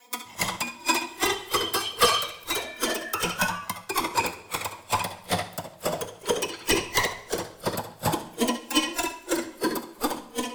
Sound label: Guitar Strings FX Sequence; Loops; Ableton Live 12 Lite; Core Library